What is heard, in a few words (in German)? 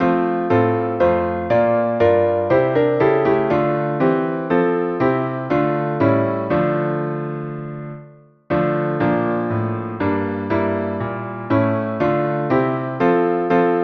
Klavier